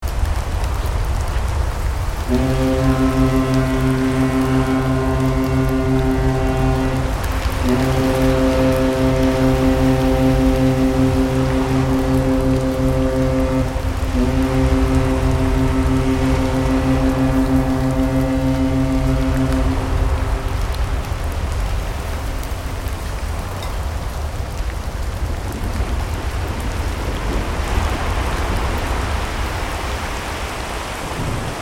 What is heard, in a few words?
Ocean storm ship ambience loop